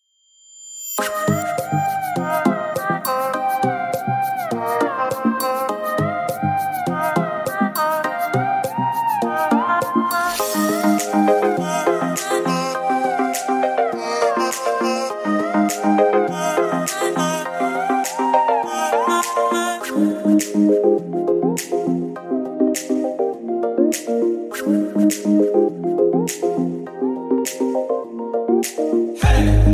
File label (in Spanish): el mejor tono de llamada del mundo